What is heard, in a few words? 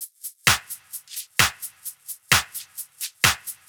Drum Loop 130, Downtown House; Drum Loops 130bpm